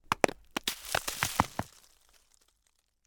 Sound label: Rock Impact Snowy Ground Roll Foliage Debris; MONO; Antisample; Rocks and Stones